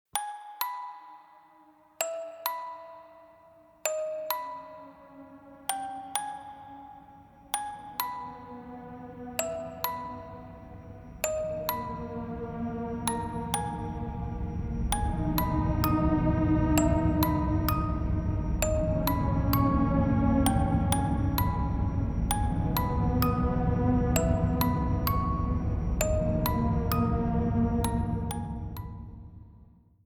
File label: dark music